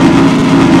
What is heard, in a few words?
jalopy low